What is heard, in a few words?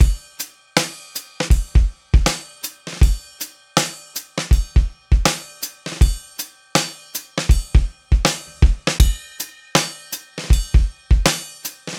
80 Acoustic Drums, Live Trip Hop Drums; Loops; Smoke Signal